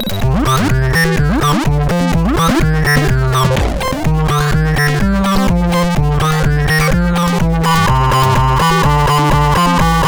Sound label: Sleepy Spacebeer, MOS6581R2; sid